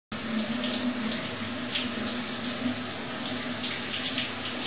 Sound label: shower